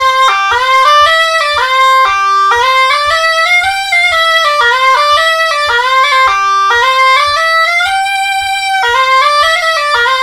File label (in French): Scottish double; Scottishs